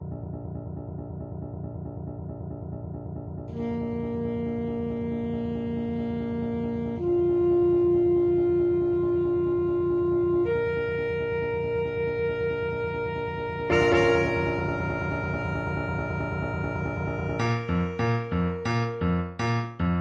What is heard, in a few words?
ASAX, saxophone